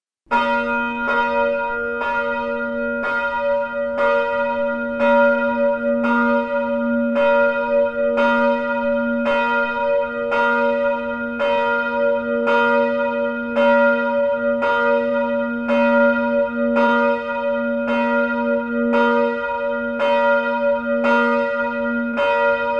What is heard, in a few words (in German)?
ton menschwerdungsglocke